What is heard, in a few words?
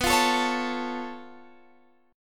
Db Augmented 7th B